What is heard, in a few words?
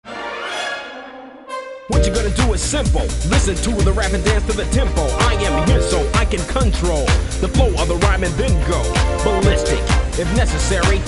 godzilla chant